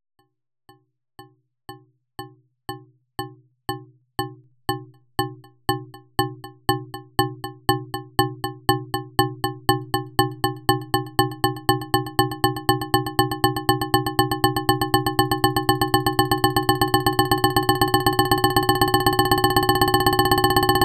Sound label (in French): battement regulier qui accelere; sons paradoxaux